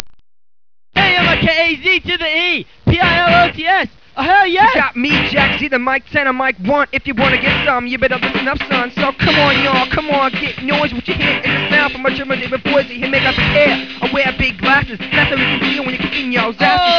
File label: Funk